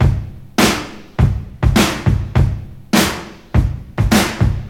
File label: 102 bpm breakbeat g sharp key, Free breakbeat - kick tuned to the G# note. Loudest frequency: 1291Hz; drum loops